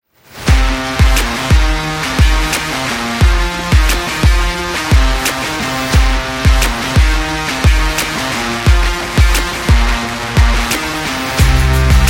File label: Energetic Indie Rock Trailer